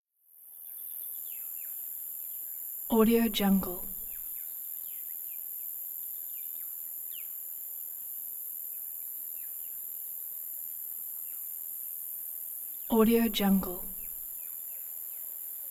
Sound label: jungle insects dawn; prosoundfx